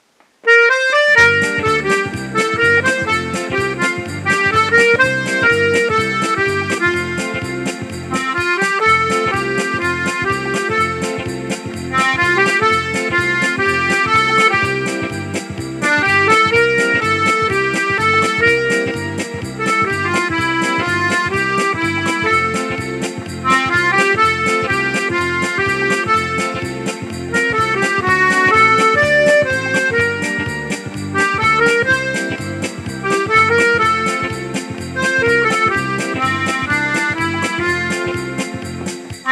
marche

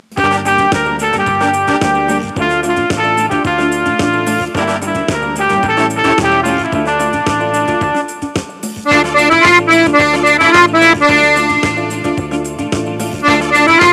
Samba

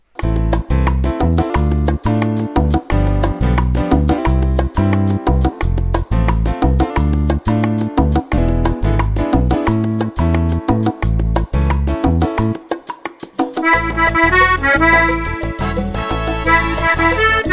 Salsa

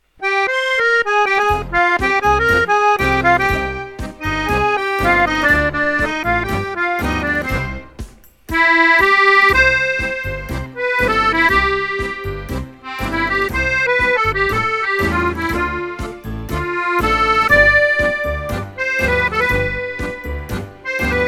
tango

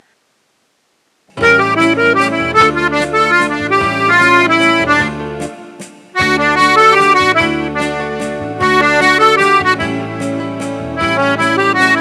Musette Java

